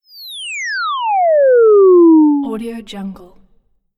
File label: cartoon twing; sounds n tones